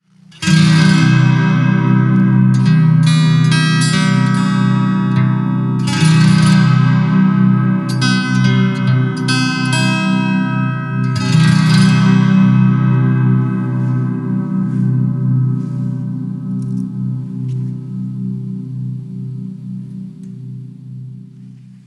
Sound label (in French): harpe